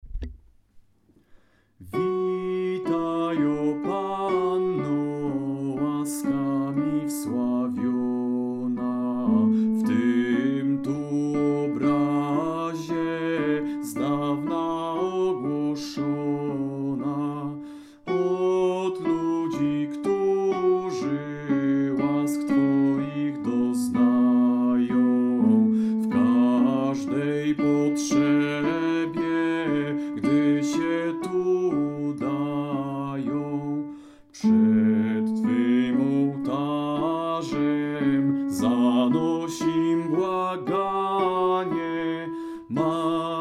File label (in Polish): Witaj panno alt